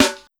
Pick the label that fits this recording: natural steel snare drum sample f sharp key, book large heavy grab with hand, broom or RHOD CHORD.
natural steel snare drum sample f sharp key